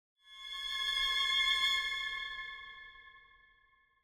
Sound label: Symphonic Hit Thin Dramatic Finale; Hollywood Edge; sound designer's toolkit v2